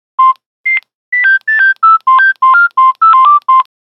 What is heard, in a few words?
Nokia Buzzer Jumping; Windows Phone apps